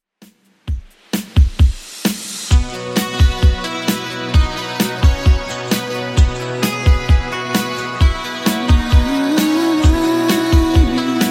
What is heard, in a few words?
80s Redrum 131bpm